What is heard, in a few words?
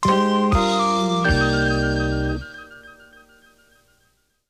weather sounder; WCTC